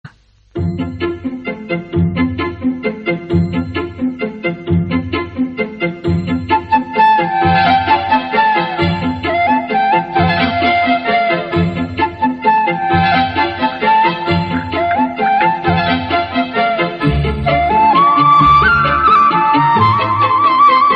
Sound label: flute ringtone